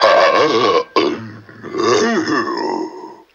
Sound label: monster; ooh ahh; growl